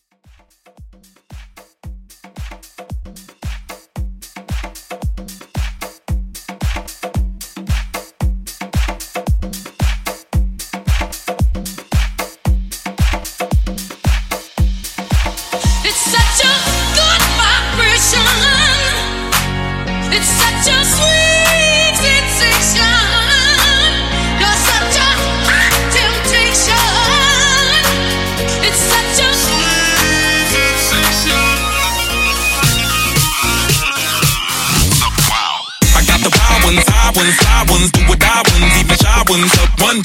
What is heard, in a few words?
In Outro Afro 113 Bpm Clean